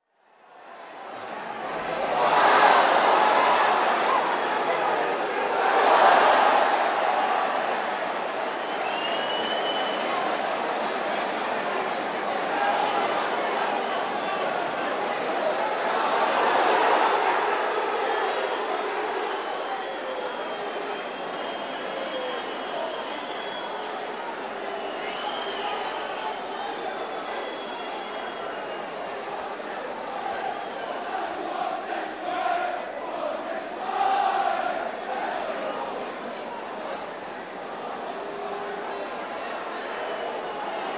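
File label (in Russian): футбольные трибуны одобрительные возгласы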